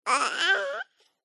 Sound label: six months old baby boy babbling short vocalization; Sound Ex Machina